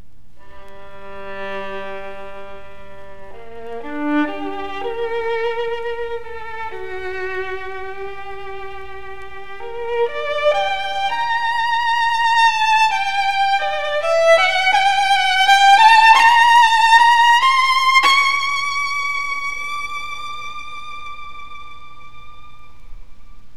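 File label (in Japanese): Stradivarius, 擦弦; 弦鳴楽器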